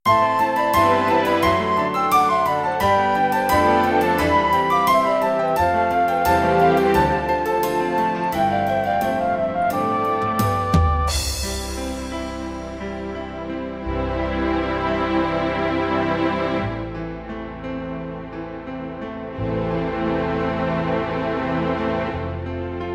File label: Classic Piano Ballad, rhythms; mzx500; preview pack 02